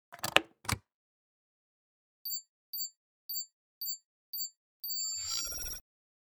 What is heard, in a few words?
RemoteCharge; Yesterday Origins